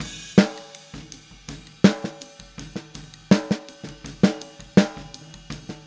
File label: Boogaloo Chorus Snare, drums, 82 BPM; Drums from the Big Room The Mixes